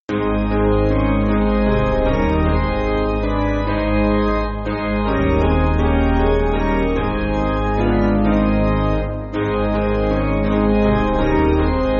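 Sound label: Piano, Free Music Backing Files for Churches; I Am So Happy In Christ; Sewell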